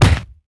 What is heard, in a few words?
Smack